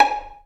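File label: Violin Pizzicato G#4; Multisamples; Digital Sound Factory Virtuoso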